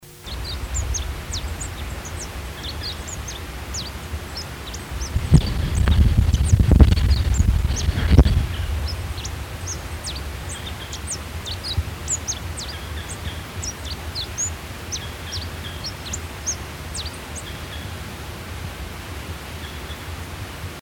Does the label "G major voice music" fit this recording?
No